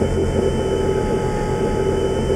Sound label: turn loop